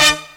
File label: HORN LICK, Hits & Brass